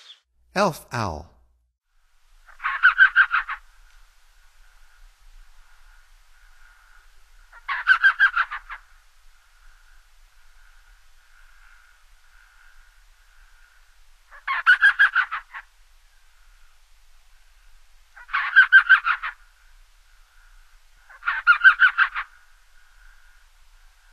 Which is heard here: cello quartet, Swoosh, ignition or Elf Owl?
Elf Owl